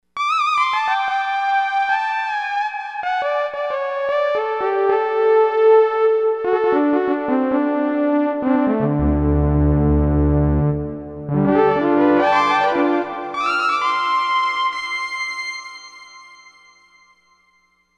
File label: Gooshy Lead